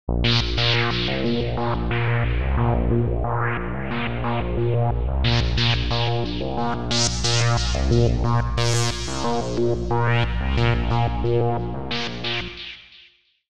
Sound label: noise phaser seq